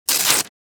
Fast paper tear rip quick

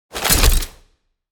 Fight combat game menu ui unlock item

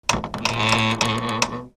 Vampire coffin lid open

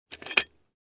Metal fire extinguisher trigger

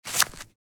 Wallet credit card sliding into slot; purse

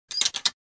Clock mechanism replacement

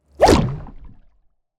Cinematic underwater whoosh

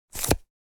Wallet removing credit card; purse

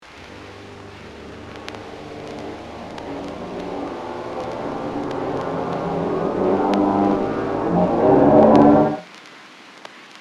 Low brass horror crescendo on vinyl

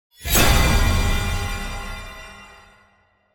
Granular madness impact jumpscare suspense

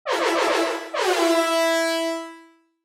Reggae air horn E3 multiple blasts

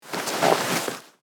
Leather fabric handling

